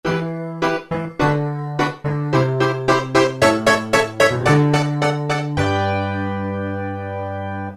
Solid Beat; Roland PN-D50-03

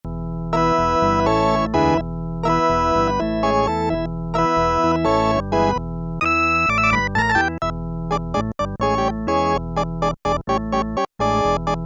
Vox Jaguar, Kawai K1m

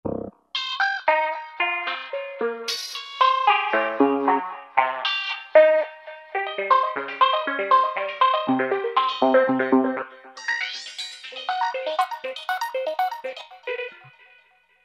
Metal Pearls, Roland V-Synth; Factory Patches

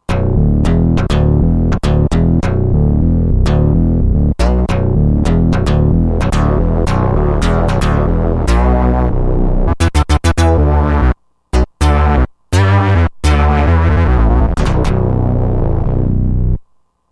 Boxer Bass, Roland JD-990; Factory Patches

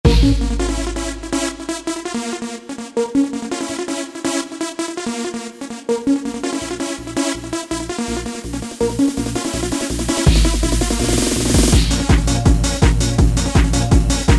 Gabba, Yamaha DJX Styles

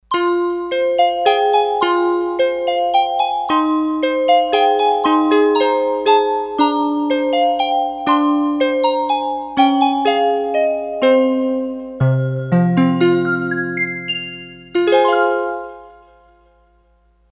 Bell Celesta, Roland D-10; Factory Patches

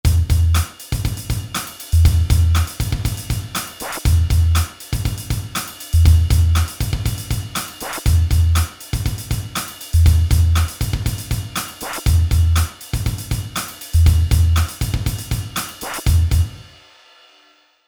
Rap Hip Hop

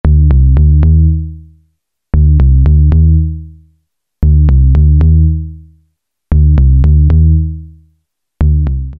Walking Bass; Alesis airSynth

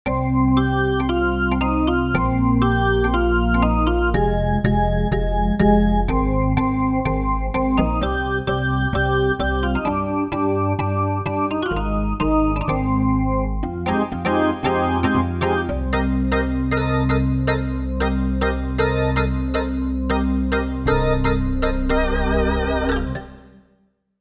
Metal Organ, Roland JV-880; Factory Patches

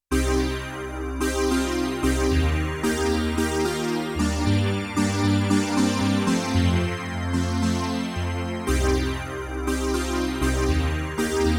Synthesizer, Yamaha TX16W; Combo Performance 1

